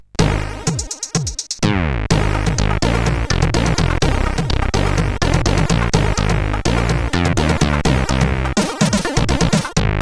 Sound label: CSG8580